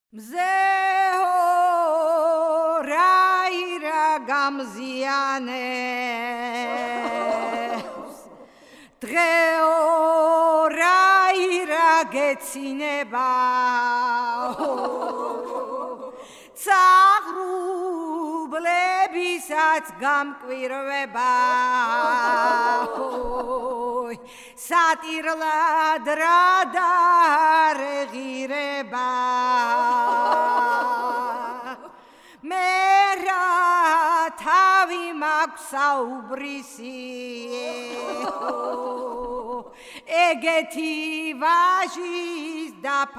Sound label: qvitini tirili fshauri; Mzetamze